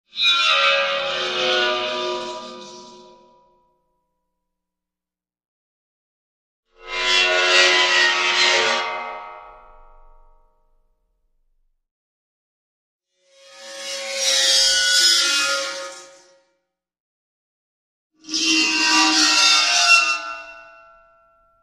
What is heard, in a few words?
Dry Ice Eerie High, dry ice